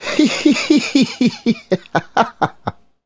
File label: Male Laugh; Laughs; Sonic Foundry (Sony Creative Software); Vocal Toolbox